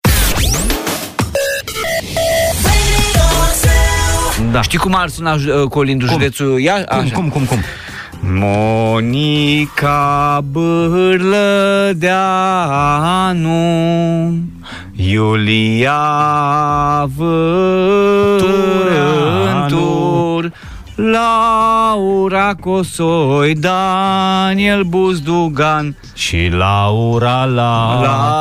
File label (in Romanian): Colind Iasi; morning zu